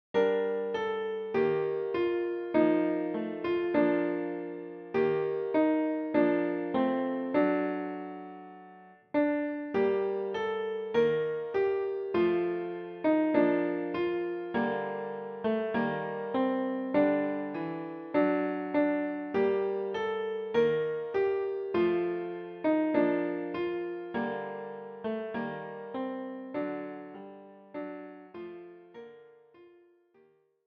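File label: piano, für Klavier, leicht; Ich steh an deiner Krippe hier; für Klavier solo, mit Vorspiel, Schwierigkeitsgrad 6, mittelschwer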